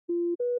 2 Tone Beep. High Pitched Computer 2 Tone Double Beep.; beeps